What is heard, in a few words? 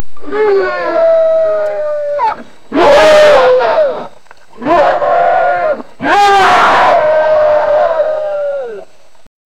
wildlife